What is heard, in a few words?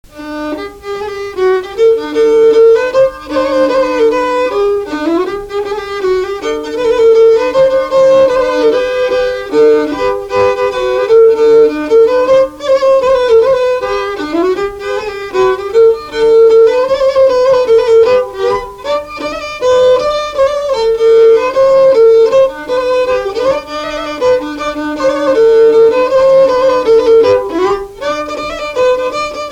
Mazurka; Rassemblement violons FdA 2017